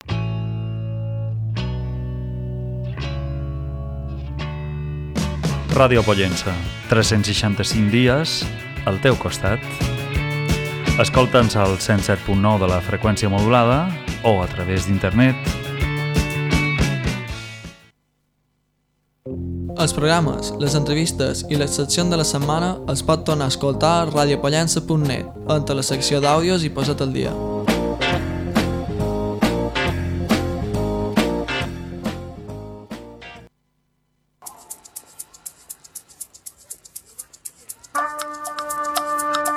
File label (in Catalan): campana